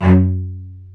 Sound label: F#1, Spiccato Celli